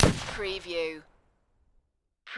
Rifle Thompson Center Encore 7mm Single Shot Exterior At 45ft